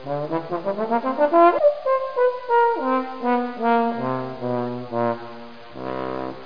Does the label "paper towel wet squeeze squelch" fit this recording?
No